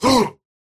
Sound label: pain small; Slork